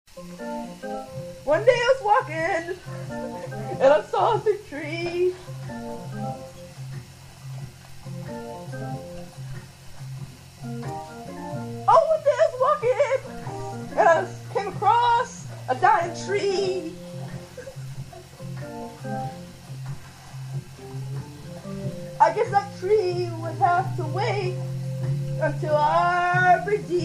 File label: student blues